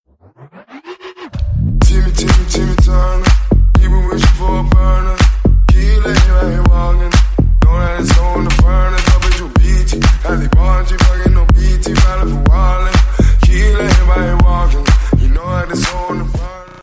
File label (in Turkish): zil sesleri trap